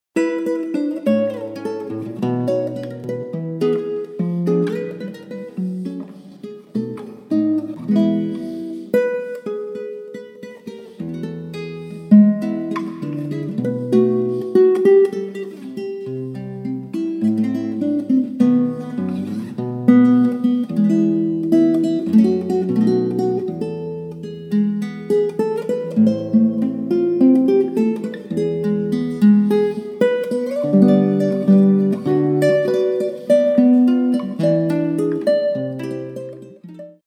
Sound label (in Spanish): tango